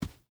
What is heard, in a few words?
footstep boots sand run; Rust